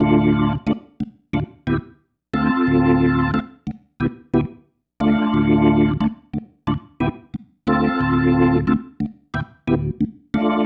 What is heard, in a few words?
Pattern, Organ